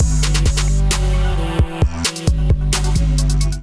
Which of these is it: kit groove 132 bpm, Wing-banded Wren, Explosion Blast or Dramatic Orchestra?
kit groove 132 bpm